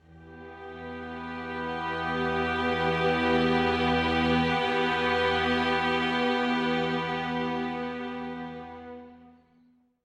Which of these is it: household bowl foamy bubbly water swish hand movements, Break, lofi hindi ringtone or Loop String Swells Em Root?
Loop String Swells Em Root